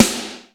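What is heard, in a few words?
POWER KITS, snare; 90 sSampleCDs; Crypton - DrumBase5000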